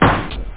wierd drum, drumkit; OctaMED SoundStudio V1

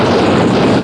Flame; Marathon revisited; ALN Flame 3

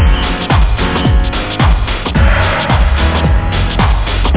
groove lo

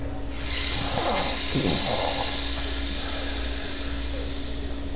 rain; Curio Strip; butterfly

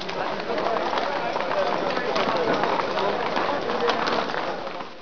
market; Caesar3

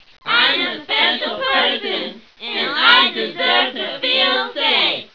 Children; T.J. Finds a Friend

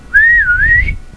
whistle; TELEFINDER

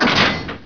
cat reset; h2siege

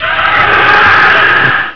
Complete Upper Spot Target; Crystal Caliburn™ DEMO

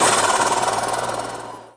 CHATTER, Car

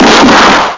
explos

